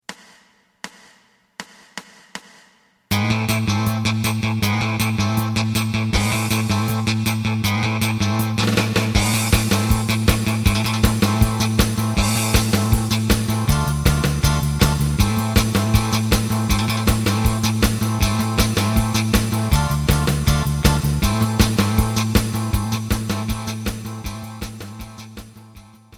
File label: lead